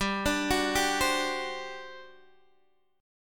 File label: G Major 7th Suspended 4th, G, down, Guitar, DADGAD